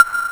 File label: SP CLAVE, SP1200; E-MU Producer Series Vol. 8 – Platinum Phatt